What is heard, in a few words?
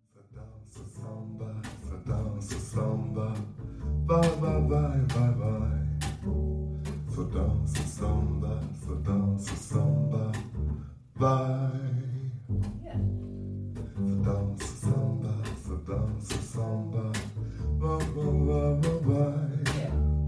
Samba